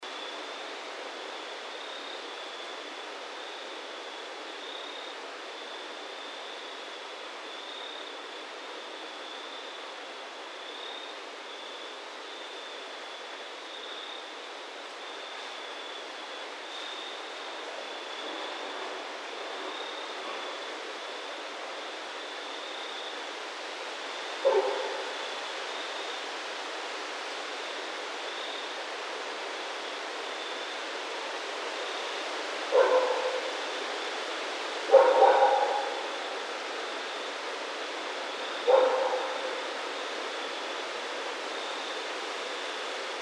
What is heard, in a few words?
Raccoon; Northern Raccoon (Procyon lotor)